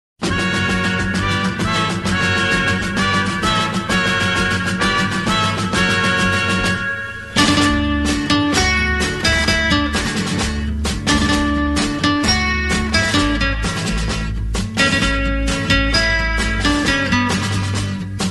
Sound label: Guitar Tango